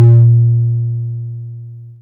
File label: tom, Korg Electribe ER-1